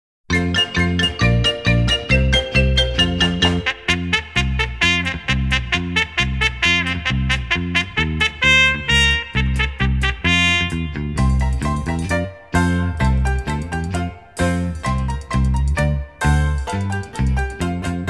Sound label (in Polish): SKOCZNA MELODIA